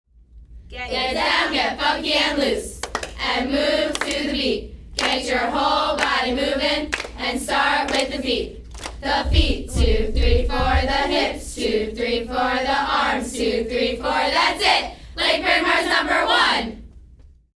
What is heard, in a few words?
cheers